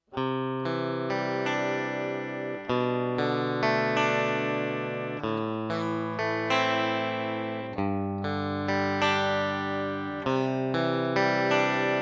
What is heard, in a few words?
clean boost; shinsmusic cleanboost